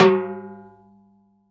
Timbal Hi; Percussion

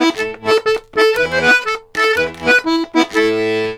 RONDO, Accordions; USB Soundscan vol.40; AKAI

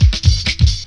Chicken Roll, Drum Rolls; Jungle; Zero-G Jungle Warfare 1, 2 & 3; 90 s Sample CDs

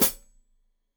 Hat Foot, Hi-Hat, Cymbals Stereo

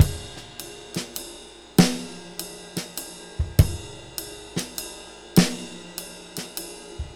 6/8 Loop, Drum Tools, Laid Back

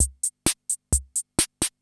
CR78

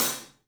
Studio Hats, 3DPercussives

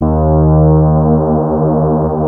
FAT LEAD, Powered DX; Wizoo

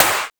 INTENSE SNR; Drums In Your FACE!

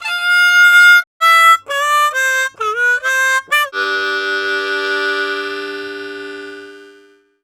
Harm 129bpm G, Harmonica

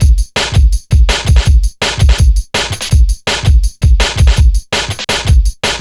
bash street, Drum Bass Drumloops 165bpm; Zero-G; Total Drum Bass

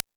Timbale, E-MU Pro-Cussion; drum machine

